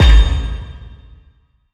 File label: ChainedFist Attack